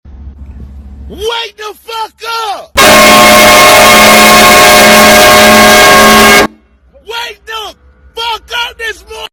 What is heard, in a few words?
semi truck horn; wake tf up